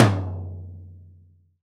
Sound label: POP L. TOM; VELO TOM 1